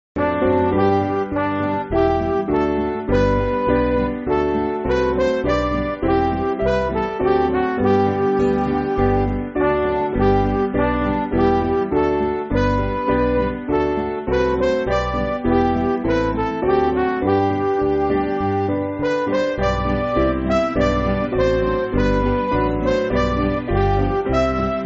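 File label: Midi, Music Backing Files for Churches; Hail To The Lords; British Grenadiers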